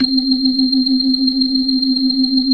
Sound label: 72 BLOOD L 1, ORGAN; Keith Emerson Signature Series